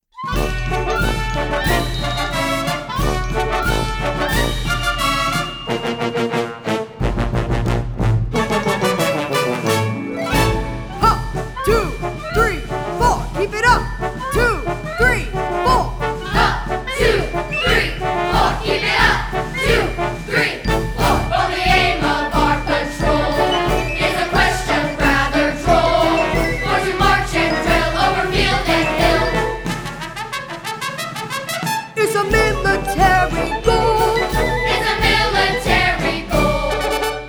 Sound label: march vocals